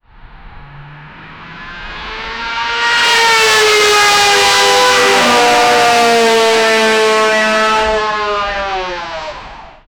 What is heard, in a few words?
a very loud lamborghini